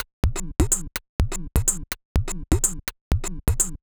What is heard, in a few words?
Top C# 125bpm, House Drumloops; 90 s Sample CDs; Zero-G House Fabrik Drumloops; ZG Drumloops